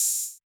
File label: HH, Yamaha DJX 2B